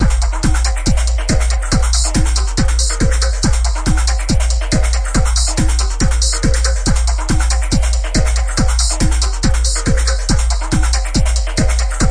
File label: 140 busy techno, drum loops and breaks